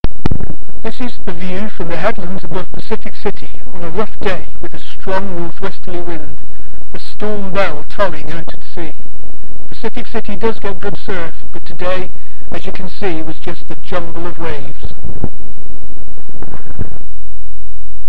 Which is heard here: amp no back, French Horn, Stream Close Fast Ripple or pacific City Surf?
pacific City Surf